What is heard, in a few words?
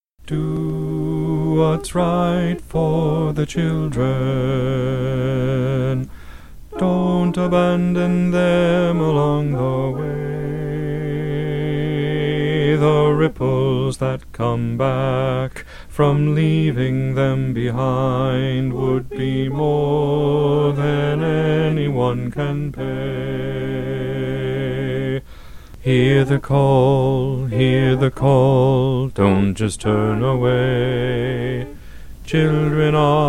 bass